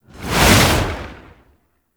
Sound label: Dash Whoosh; Warframe; Lasrian Soldiers; Abilities; Butcher